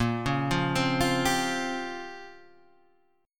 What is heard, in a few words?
Bb Minor 6th Add 9th Bb down Guitar Standard; arpeggiated; JGuitar